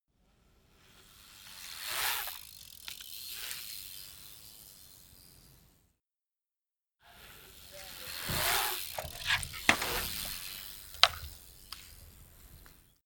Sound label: bmx maneuver pipe in and out